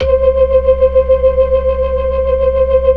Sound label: 36 BLOOD L 2, ORGAN; Keith Emerson Signature Series